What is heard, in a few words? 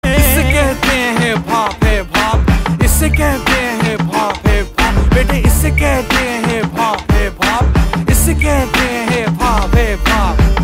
Hip Hop Ringtone